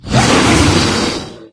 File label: ceiling attack solo, wraith; doom3